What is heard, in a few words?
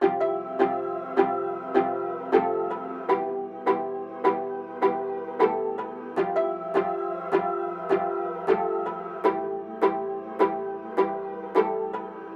156BPM